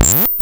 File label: Zipper Buzz; 300 Drum Machines; DevSnd